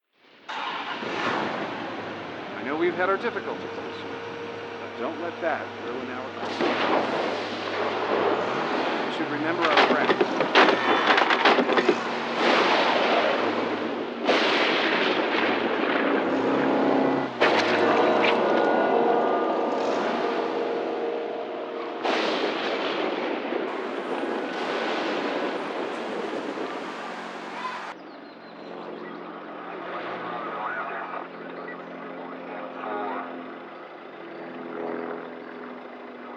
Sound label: Television